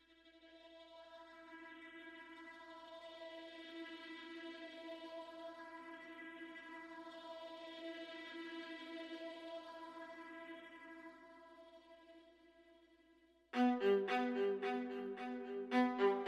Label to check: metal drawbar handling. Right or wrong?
Wrong